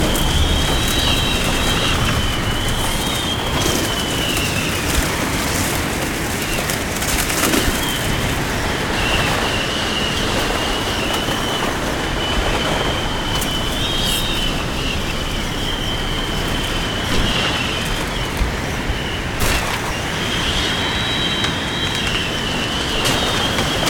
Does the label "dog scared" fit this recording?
No